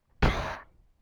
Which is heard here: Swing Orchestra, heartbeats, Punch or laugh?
Punch